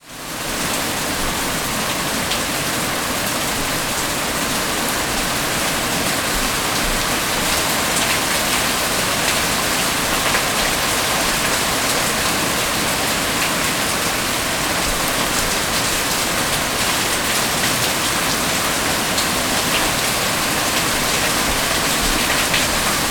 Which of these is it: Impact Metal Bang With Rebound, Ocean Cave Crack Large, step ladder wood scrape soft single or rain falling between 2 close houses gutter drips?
rain falling between 2 close houses gutter drips